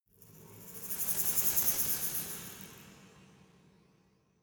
resonator rattle dynamic

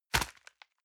envelope grab hard

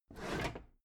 packed drawer smooth clatter open heavy halfway

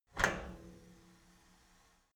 cleaning machine open handle quick

